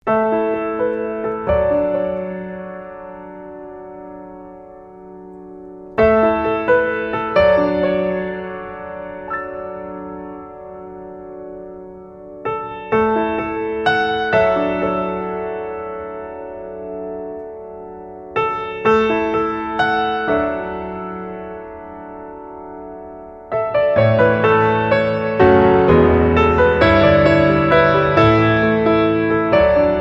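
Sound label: Holly and The Ivy, without Jingle Bells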